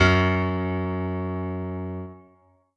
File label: keyboard f sharp low